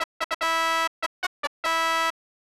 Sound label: 1-Up; Cool Spot; SNES